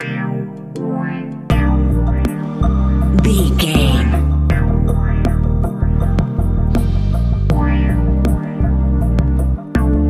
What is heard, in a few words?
tropical dream